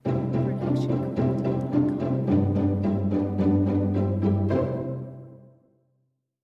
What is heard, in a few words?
Friendly Ident Plucked Strings; SoundsCrate